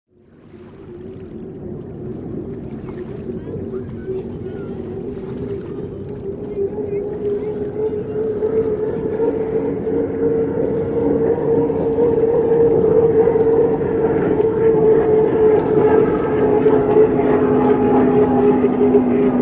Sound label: Hydroplane By Splas